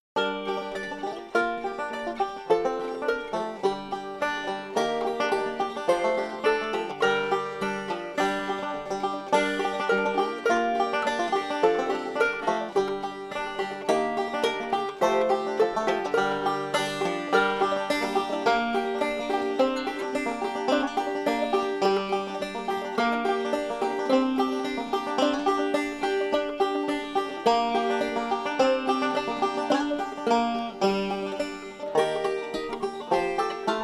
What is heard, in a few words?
banjo